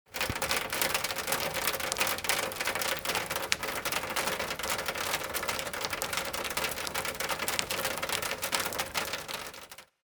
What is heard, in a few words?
water